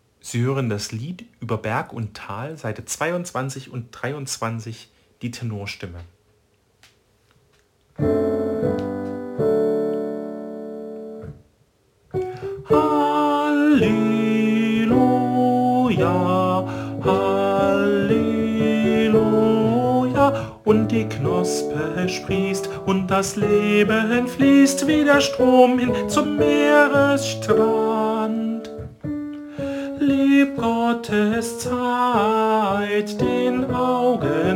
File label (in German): Tenor; Chorfest der EKM auf der BUGA